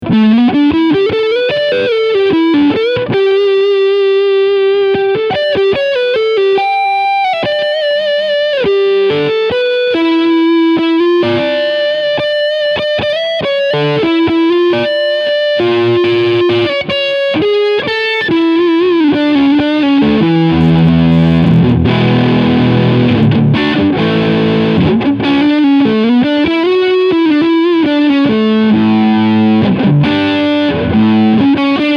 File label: Leadsound Front PU